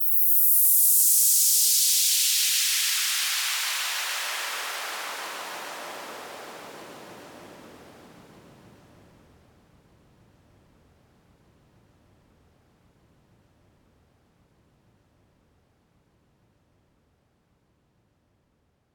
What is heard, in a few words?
Noise Downlifter